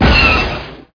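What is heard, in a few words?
walker shot; MOTS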